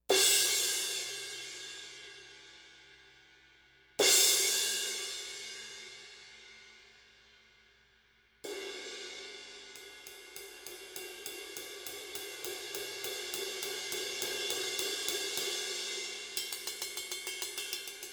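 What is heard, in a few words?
20 Sabian, cymbal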